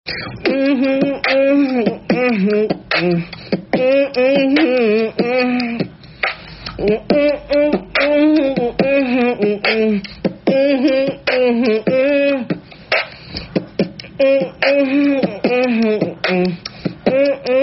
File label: beatbox; pokemon